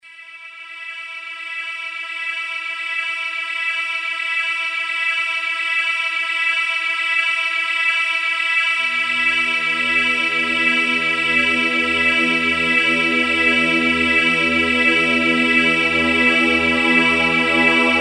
pad algorithm, Roland JD800

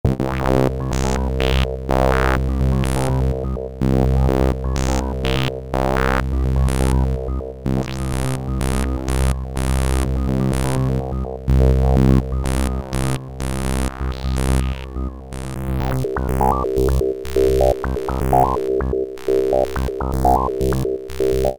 synth; Emu XL1 Xtreme Lead; analog modular filter

